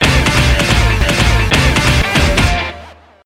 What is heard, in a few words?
outtro music build